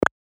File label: ui click one